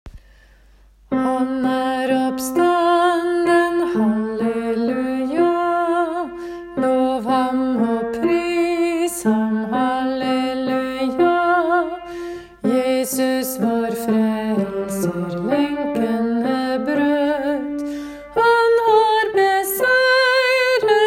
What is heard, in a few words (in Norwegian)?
han er oppstanden bass; åfjord